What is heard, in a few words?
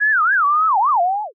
rewind time